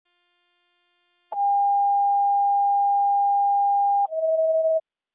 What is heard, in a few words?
TONE